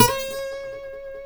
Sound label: D 5A, Guitars; Spectrasonics; Hans Zimmer Guitars VOL-1; MARTIN HAMMR